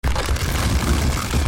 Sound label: stampede, dinosaurs; Crossy Road